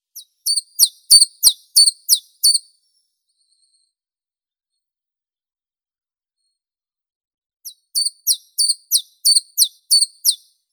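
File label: chiffchaff, Phylloscopus collybita; penochka tenkovka; zvuki prirodi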